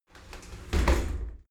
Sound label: shower slide doors close regular; objekte; tueren